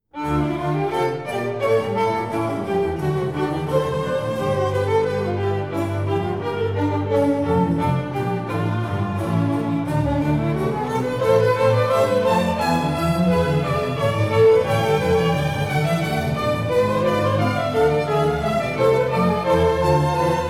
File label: Violin concerto double in d BWV1043 I. Vivace